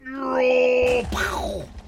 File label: bam pew